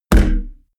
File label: heavy saturated damped, hits impacts; whooshes rises